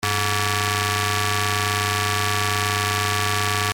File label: ring